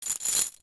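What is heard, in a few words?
Chain Movement Short; Civ4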